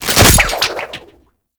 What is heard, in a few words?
Grapple Rope Break